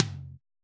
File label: tom, Roland MC-909